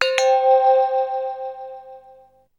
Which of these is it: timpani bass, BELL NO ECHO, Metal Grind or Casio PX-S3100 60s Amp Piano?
BELL NO ECHO